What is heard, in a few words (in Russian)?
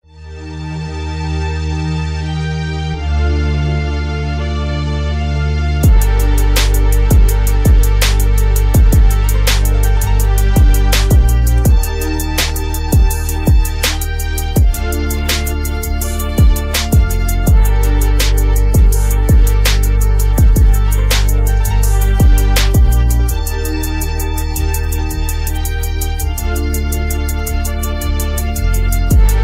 Instrumental